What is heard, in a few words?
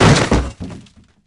large furniture